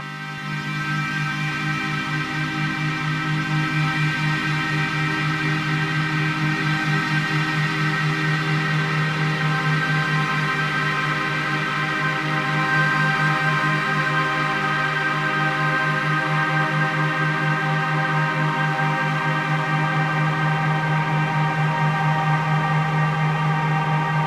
Black Box Delays; CristianVogel; EsotericLandscapes

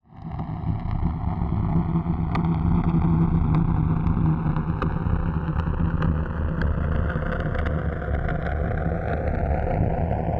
Surface Dust Ambience; SurfaceDustAmbienceMaker

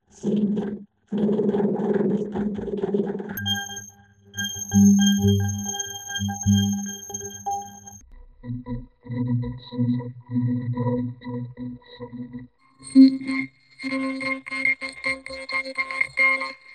Super Hot Filter Vocoder

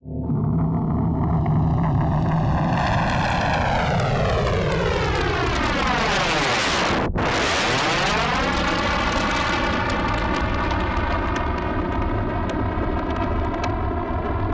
Surface Dust Ambience; SurfaceDustAmbienceMaker

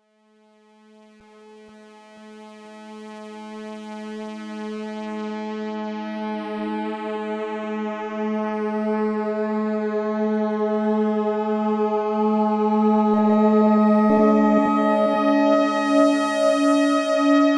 suboptimal subharmonics

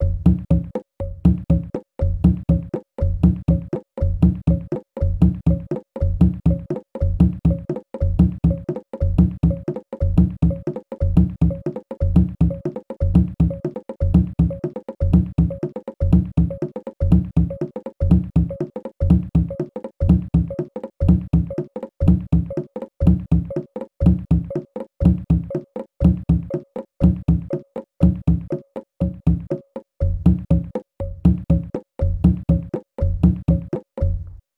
phasing

